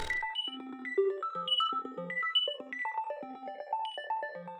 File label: roll Sound